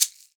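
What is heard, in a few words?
shaker, percussion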